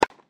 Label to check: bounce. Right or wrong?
Right